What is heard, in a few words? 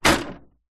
office printer compartment close hard; zapsplat